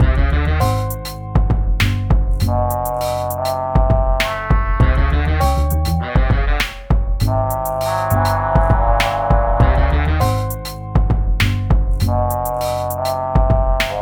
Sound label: Haunted Bicycle; Jimmy and the Pulsating Mass